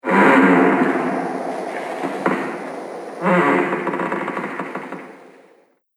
Haunted Creaking Sounds; Ghost Sound Effects; Horror Sound Effect

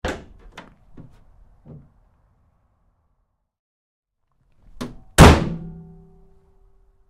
Truck GMC Savana Door 2nd Rear Open-Close Hard; 2010 GMC Savana; Trucks; Big Room Sound

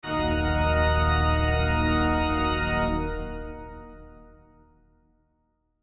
Cathedral Organ Thick Chord; Keyboards; Blastwave; Sound Effects:Musical

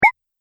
Multimedia Button; Andrew Fleming

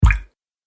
Water Puddle Splat; Water Sound Effects; nature

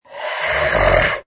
Monster Animal Sneer; Monster sneer: Short snarl or growing sneer from large fantasy animal or canine creature; Lynne Music

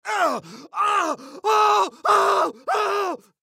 Human Scream; Scream Long Multiple Painful Male Fifty Yrs; Screaming

